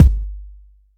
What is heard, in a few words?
big room kick single shot g sharp key; kick drum